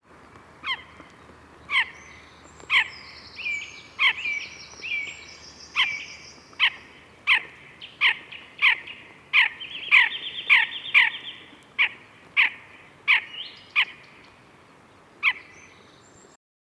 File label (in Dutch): Kuifduiker Podiceps auritus